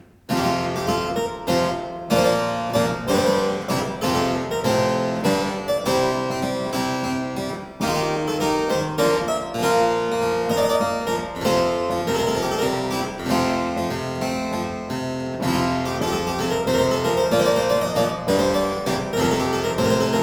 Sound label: The Galliard to it (harpsichord); Byrd